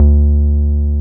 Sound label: 303 SUB D3M, SUB BASS